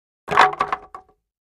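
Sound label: 2x4 Several Planks Dropped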